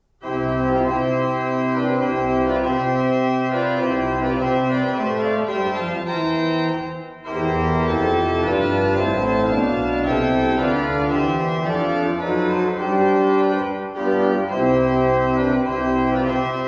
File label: Simon